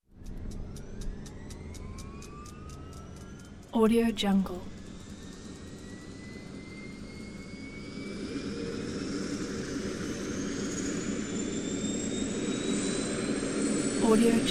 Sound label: helicopter engine launch